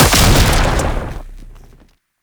Sniper Lightning Strike; Weapons; Warframe; Sentient; Caliban Prime Sniper

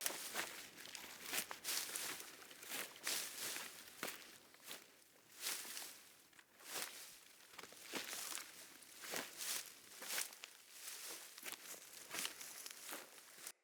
Wolf Steps Jog Loop Vegetation; Animals; Footsteps

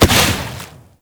Bubblegun Pistol Hit Explosion; Warframe; Tenno

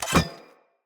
Sphere Polearm Fast Equip C, Weapons Player

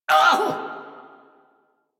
Pain, en; Warframe; Corpus Aristocrat Manager; Treasurer; Battle Barks

